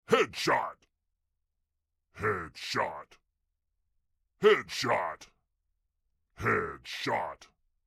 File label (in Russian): zvuki khedshot